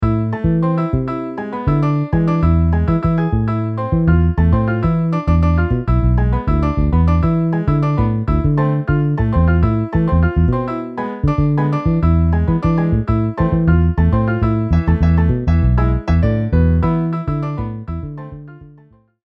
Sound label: Piano Bass MIDI